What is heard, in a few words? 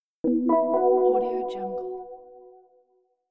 notification sound